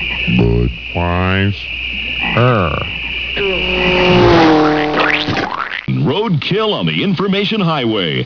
bud splat

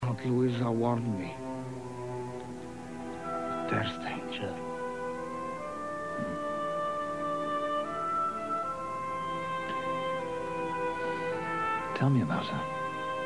breathe

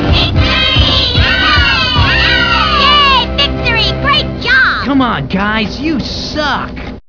yay

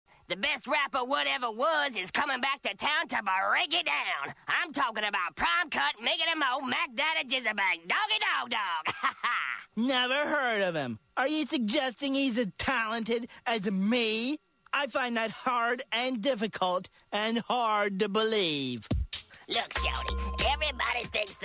record store rap

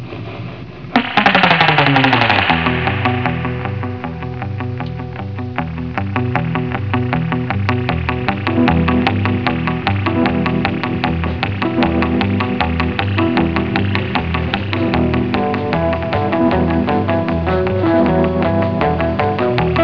eng pipe, 1961 Buick; Pipeline; 1961 Buick Engine options

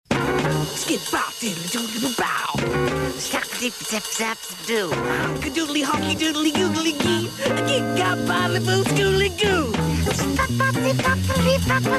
Jazz

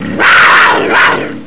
cougar

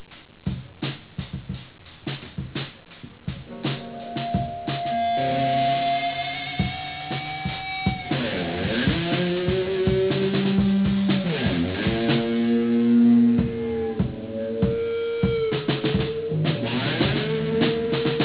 distortion, riffs; Feedback; The feedback from the guitar used to annoy the animals in the Pet Store that we used to practice at.

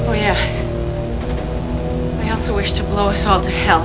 blowup

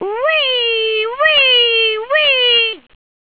whee whee whee

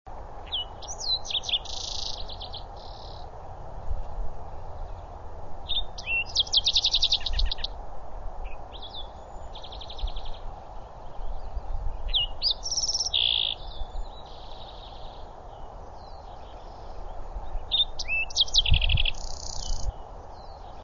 towhee green-tailed

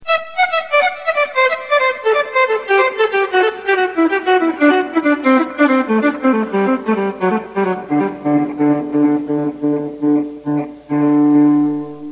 swell

